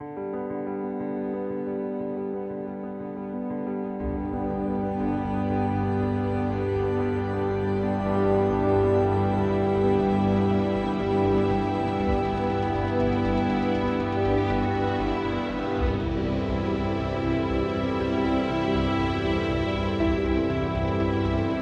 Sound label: Fragrant Ambient